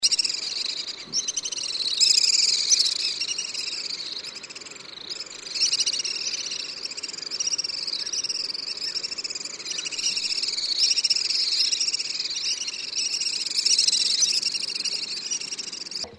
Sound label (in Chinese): 小雨燕; 家雨燕、小白腰雨燕; 雨燕科/留鳥